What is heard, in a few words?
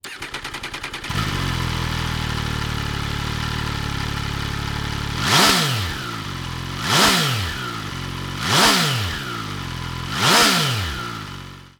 turismo veloce rc scs